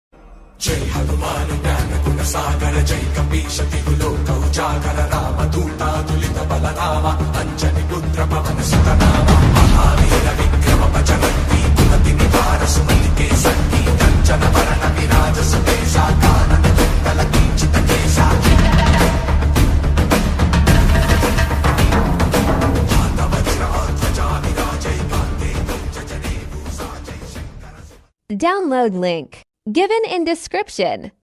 powerful hanuman chalisa ringtone bhakti instrumental